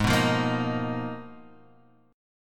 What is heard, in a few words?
G sharp Minor 6th Add 9th